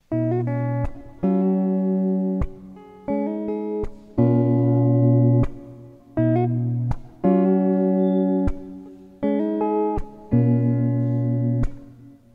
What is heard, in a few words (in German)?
Gitarre Zwischenspiel